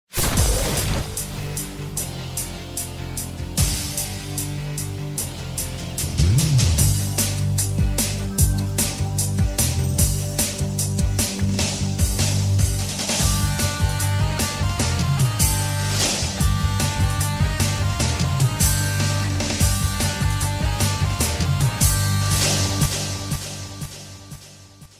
bgm effects mixed

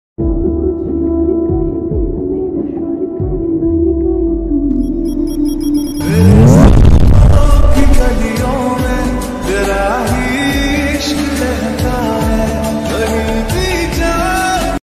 lofi ringtone love ringtone sad song

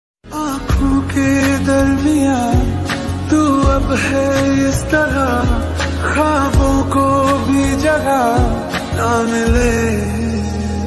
lofi ringtone

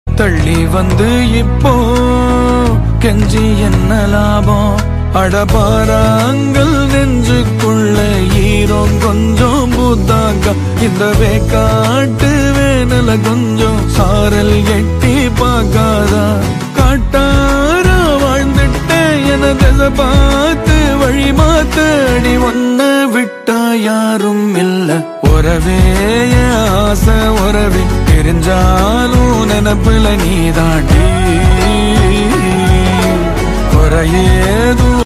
love song ringtone tamil; lubber pandhu

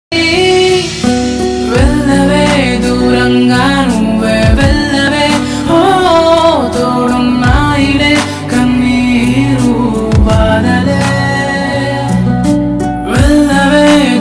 feelgood ringtone

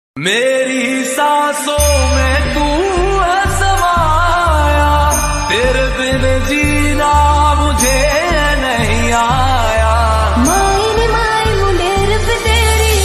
bhakti ringtone hindi ringtone; mahadev ringtone; shiv ringtone

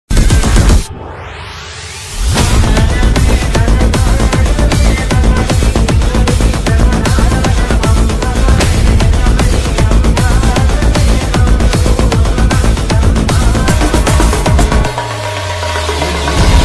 bgm ringtone

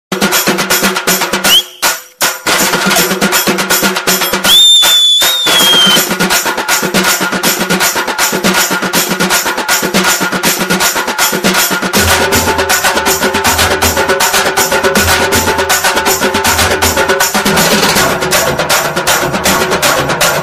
telugu mass beat ringtone

